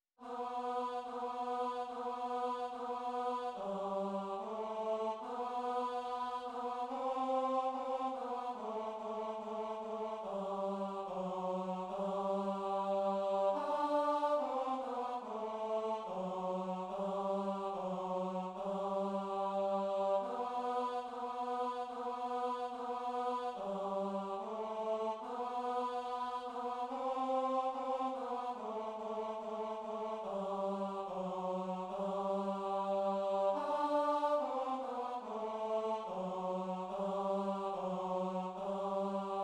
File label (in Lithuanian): Tenoras Šv Augustino mišios Dievo Avinėli